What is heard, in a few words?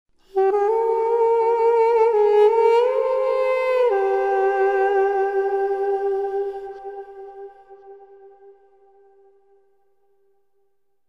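theme melody; Myst III Exile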